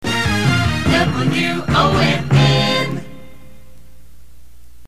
jingle; WONN

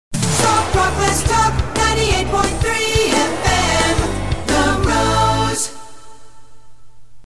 jingle; WWRZ

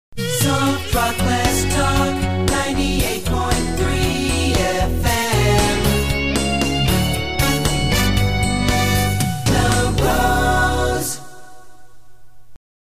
jingle; WWRZ

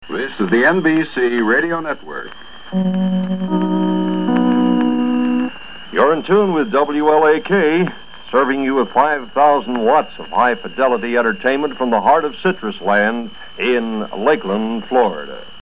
NBC chimes station id; WLAK

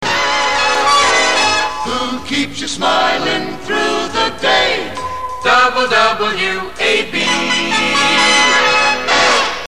jingle; WWAB

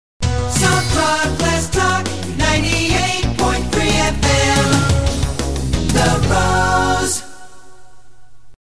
jingle; WWRZ

